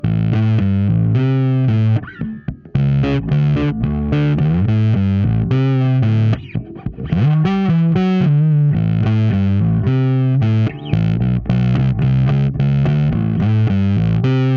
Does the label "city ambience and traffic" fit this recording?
No